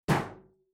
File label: Metal Footfall; soundscrate